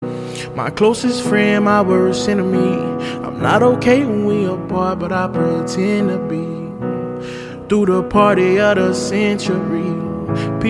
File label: ACOUSTIC